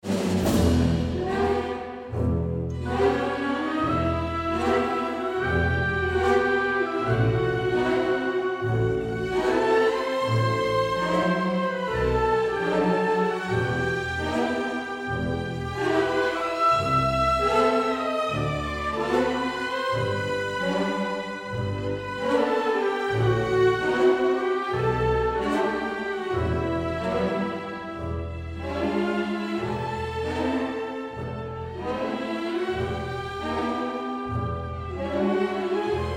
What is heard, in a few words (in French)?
Marche funebre